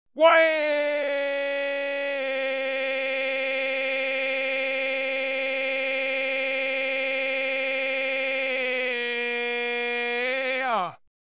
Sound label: childish dad crying